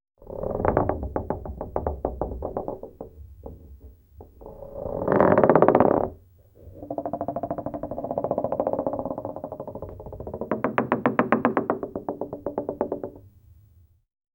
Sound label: rolling wooden ball on; rolling wooden ball on me